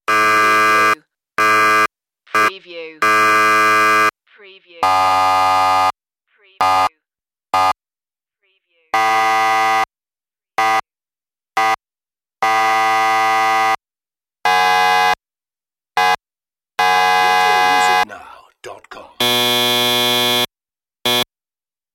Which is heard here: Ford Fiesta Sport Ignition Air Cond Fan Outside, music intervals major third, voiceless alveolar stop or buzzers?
buzzers